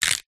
horror bone break snap; zapsplat

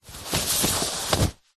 flag handling movement; zapsplat

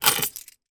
foley handcuffs metal pick up from wooden shelf; zapsplat

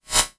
multimedia rollover; sound effects one

